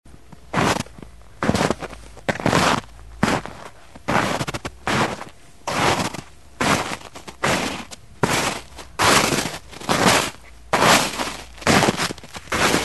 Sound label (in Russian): zvuki zimnego lesa